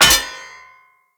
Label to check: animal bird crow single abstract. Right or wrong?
Wrong